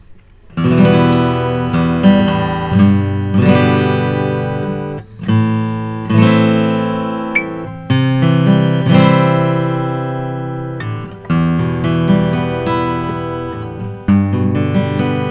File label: custom om alpine spruce, guitars; martin; cocobolo; 1 75 nut